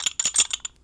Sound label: Pipe Wrench; Smol Mechanisms